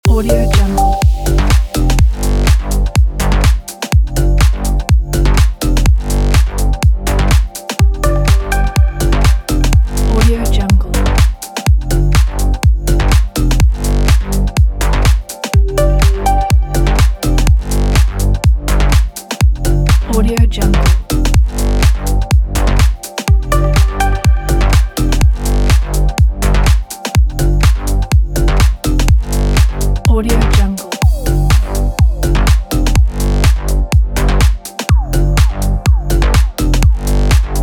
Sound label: essential deep house loop; playtek